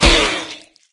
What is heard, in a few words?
pain head; doom3